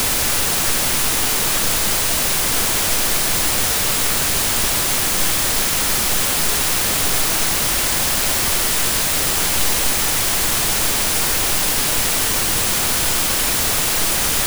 Thermal noise; sound effects and sound reinforcement

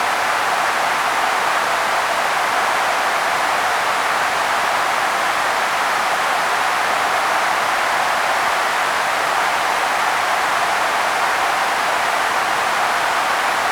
One Khz noise; sound effects and sound reinforcement

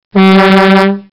limousine horn; sound effects and sound reinforcement

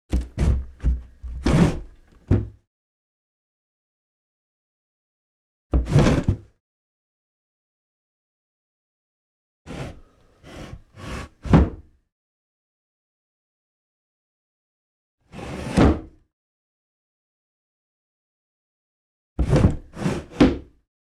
old wooden sash window opening and closing; sound effects and sound reinforcement

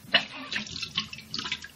barf; sound effects and sound reinforcement

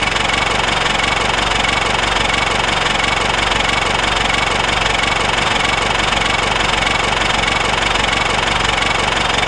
model t engine idling; laundry truck; sound effects and sound reinforcement

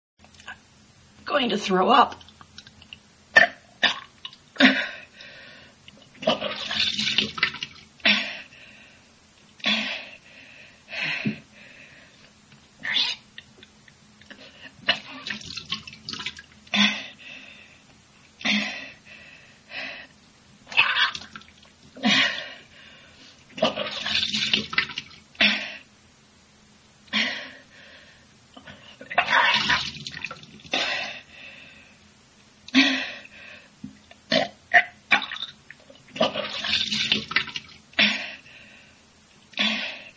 puke; sound effects and sound reinforcement

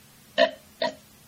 barf; sound effects and sound reinforcement

